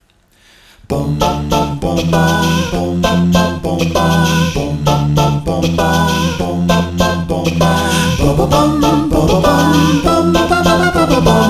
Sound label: acapella; ocarina of time